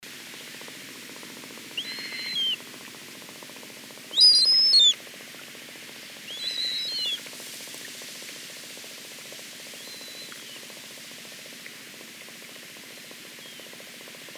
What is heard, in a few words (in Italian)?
Sterna maggiore Hydroprogne caspia